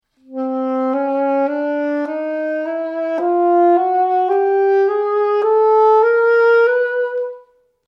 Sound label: Chromatic Scale C B